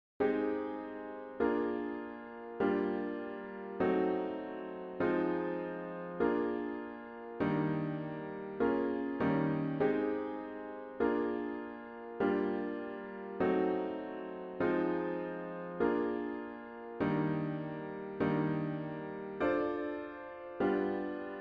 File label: chords only